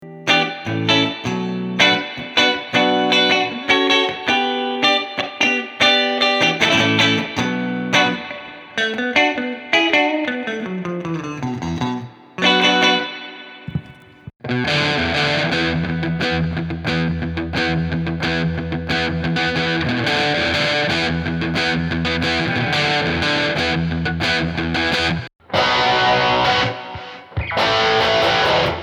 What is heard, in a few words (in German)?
beispiel blues deluxe amp mit ox amp top box